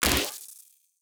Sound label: Sci-fi Energy Gun Blast